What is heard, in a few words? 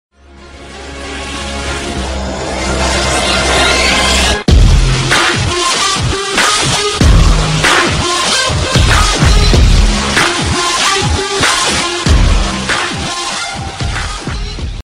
trance ringtone; ipnone x plus